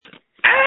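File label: Scream, phone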